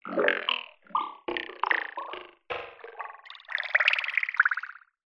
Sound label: science fiction warped water drips; zapsplat